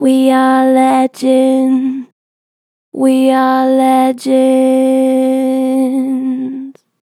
Vib We are legends, Vocals, Natural Vibrato Gentle; Harmor Vocals